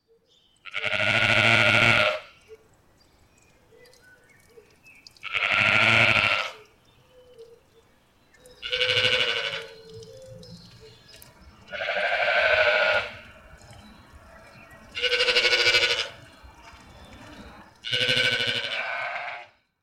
Mufflon Ovis musimon

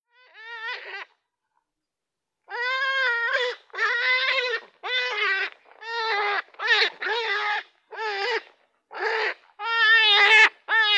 Feldhase Lepus europaeus

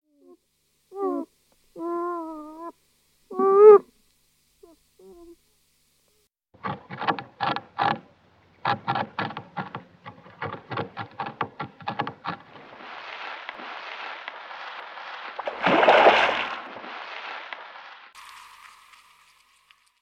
Biber Castor fiber

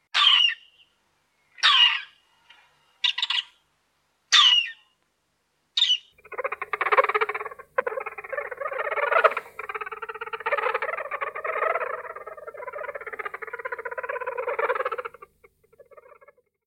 Hermelin Mustela erminea

